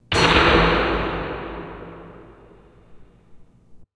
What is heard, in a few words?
clang